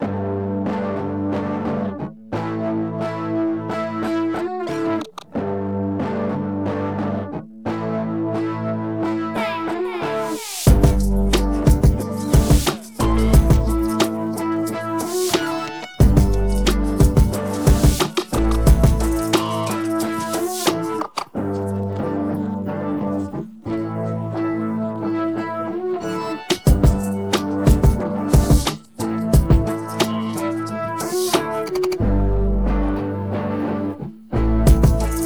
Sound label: instrumental